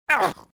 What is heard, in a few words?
grunt, pain death, male; Soldier Of Fortune II Double Helix; XBOX